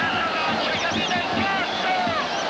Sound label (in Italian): insulti al megafono; romadundee; Processione